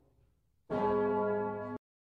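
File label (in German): Glockenton, bell tone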